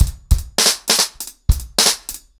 100BPM, ACOUSTIC DRUMS

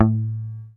APX A2, bass; Sampleheads; Will Lee Bass Library

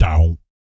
BASS FX; ILIO; Vocal Planet VOL-3; Jazz & FX

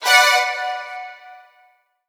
STRGS, Roots Funk; USB Soundscan vol.41; AKAI

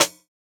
Snare, jungle breaks drum sounds

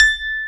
PIA ELEC. A6, PIA CP-70; Best Service Gigapack 1

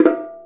Bongo Flam; Essential Percussion

